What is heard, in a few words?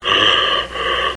breath alert; tf; slender; shape